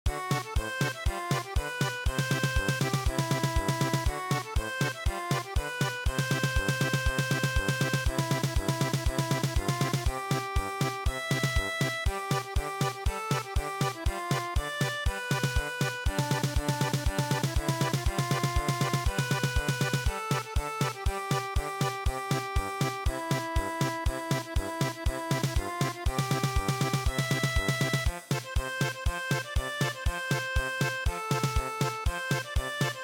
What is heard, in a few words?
German Polka; HE ALOHA NO O HONOLULU